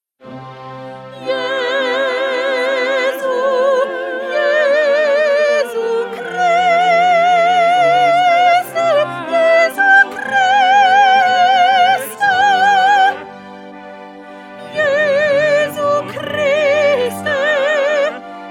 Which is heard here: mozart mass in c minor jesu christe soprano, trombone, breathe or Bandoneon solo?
mozart mass in c minor jesu christe soprano